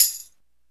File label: TAMB; E-MU Formula 4000 Series Vol. 2; Dance Set 2